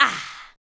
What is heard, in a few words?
ADLIBS